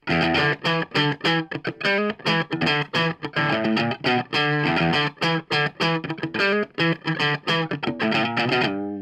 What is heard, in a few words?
Digging